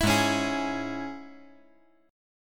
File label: Ab Suspended 4th Sharp 5th, chord; 9,8,9,11; 5G,4C,5E,5A; strummed; JGuitar